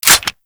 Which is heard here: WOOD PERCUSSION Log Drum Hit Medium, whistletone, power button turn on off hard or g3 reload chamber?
g3 reload chamber